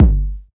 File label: tight low end kick drum single shot g key, Royality free kick tuned to the G not